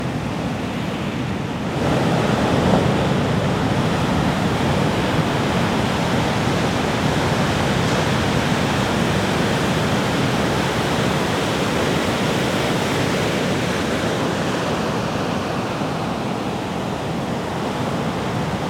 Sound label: Sea Waves Wind